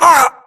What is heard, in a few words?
grunt death neck; Titanfall 2